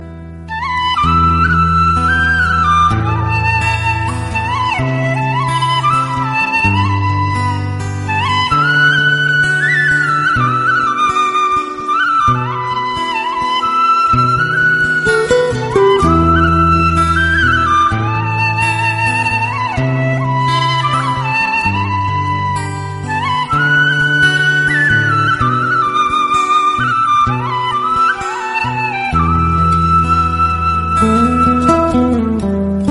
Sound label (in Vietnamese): sao truc